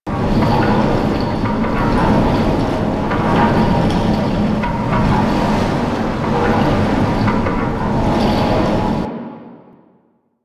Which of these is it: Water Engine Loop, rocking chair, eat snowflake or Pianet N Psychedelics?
Water Engine Loop